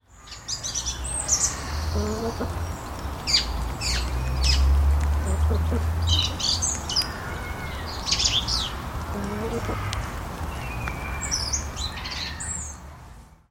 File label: autumn singing song thrush